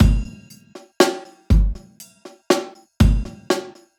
Ghost Break

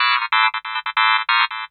TECHNO SYNTH 140; Keys

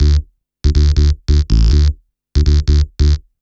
ELECTRO BASS 140 A

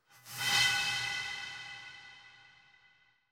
bottle, ethno, 125

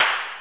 CLAPS; CLAPS+SNIPS

